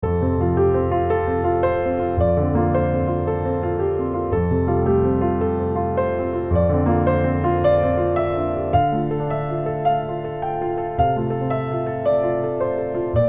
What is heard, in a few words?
anthem